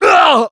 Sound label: Spy pain severe, fr